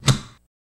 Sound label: Elect Switch On, switches